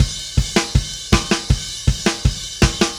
Happy Pub Beat, Indie Rock; Sonic Foundry (Sony Creative Software); Indie Rock Anthems; Session 05 - Happy Pub

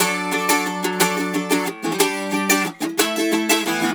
Celtic Flavours; USB Soundscan vol.42; AKAI

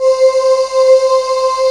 jam synth, E keys

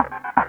PULS BEAT, Synth FX Misc

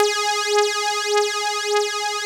phase me G#3; Best Service Alpha Dance vol.2

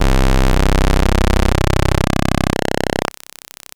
Synth Pulse c0

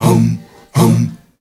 MALE HUM; Spectrasonics; Heart of Africa

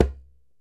DJEMBE, African Drum

